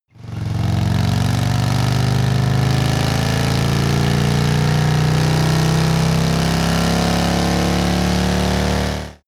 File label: motorcycle harley accelerate long; motorraeder; verkehr transport